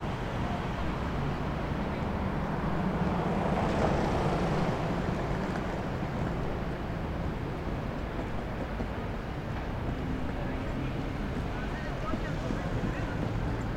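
ambience urban city london whitehall big ben in background; sound effects one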